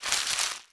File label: delete, ui; Infinix NOTE 30